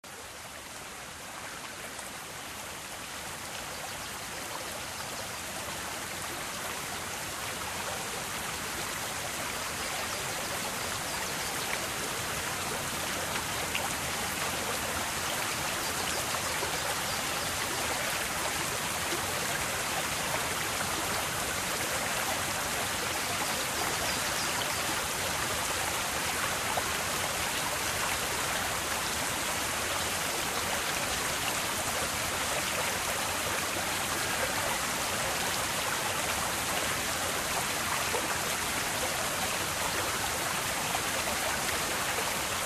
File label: Stream Water